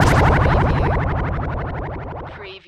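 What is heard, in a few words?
Production Element Hit Sweeper; Sound Effects Bible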